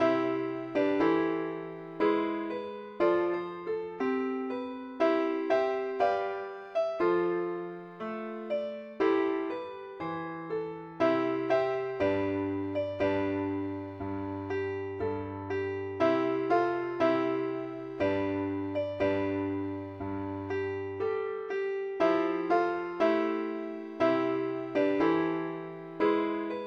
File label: ukelele, key c; pilot